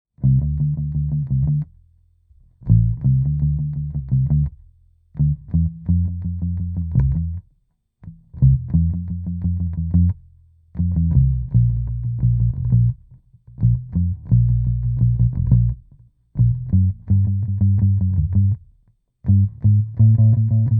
ev pl33 club pick

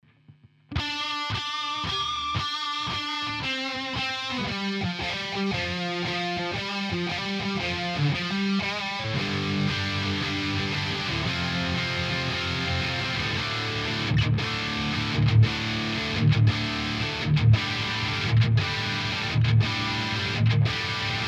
vintage hi sat, mesa boogie

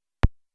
focusrite 6i6 2nd gen plop sound; ausgang obwohl ausgeschaltet

